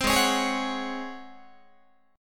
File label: G sharp 11th G sharp, down, Guitar; G#11; strummed